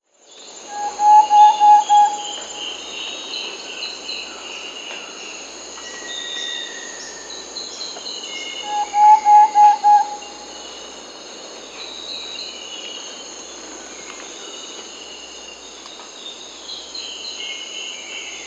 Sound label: Thrush-like Antpitta (Myrmothera campanisona), Peru; S. America